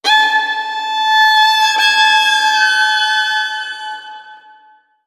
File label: plank germs, music mob; Left 4 Dead 2